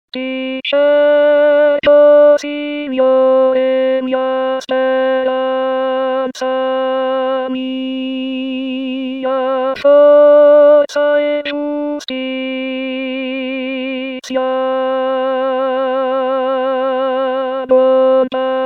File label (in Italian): Contralti; voci guida; corali in vaticano 2024